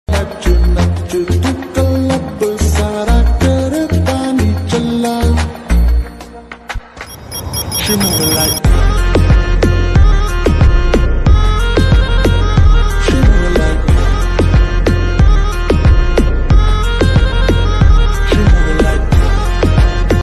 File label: lofi rap ringtone